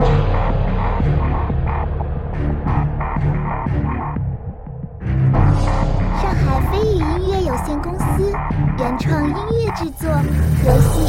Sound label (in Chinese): 紧张压抑 FPS